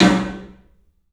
PA DEEP L, CLUB SN VRB; 3D Sound; 3D Drums

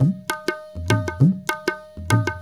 INDIA L; Spectrasonics - Heart of Asia CD2

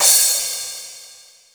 crash cymb; East West - Global Sounds; SC55 DRUMS

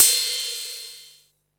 hat, Techno 138 BPM

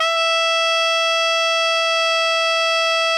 ALTO FF E 4, saxophones; Greytsounds; Philip Wolfe David Crozier's Saxophones

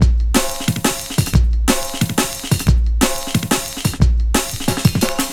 Rugged Break, Drum & Bass, Beats & Breaks; Fluid Dynamics

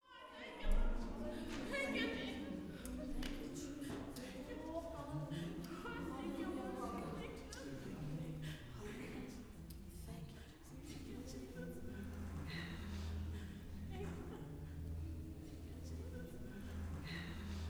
WORSHIP, Gospel; Spectrasonics; Vocal Planet; WORSHIP AMB

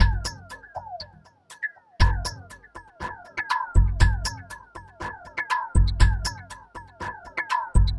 Mod Loop, Modular Percussion

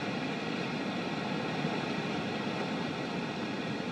Ambience Loop, outdoor

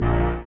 SYN DANCE; Sound & Vision - Gigapack II

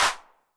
HAND CLAP, 909 Reverb Pitch

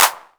CLP, Korg Electribe EMX-1

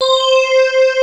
WAV SWEEP C5; Big Fish Audio; Synth City

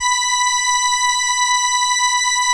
ELKA, String Machines

